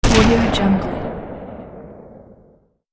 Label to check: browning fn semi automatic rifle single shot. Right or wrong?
Right